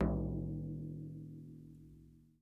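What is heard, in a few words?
Large Frame Drum, World Percussion One Shots; Sony CS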